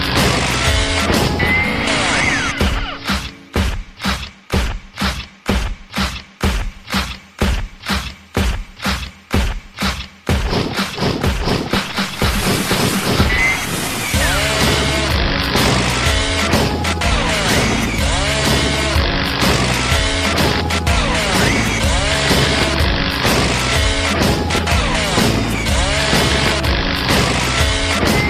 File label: acoustic music